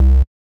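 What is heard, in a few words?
Sq Bass C1, square bass; Grime; 135bpm; Zero-G Sound Sense Grime Scene; Construction Kits; A Positive Vibe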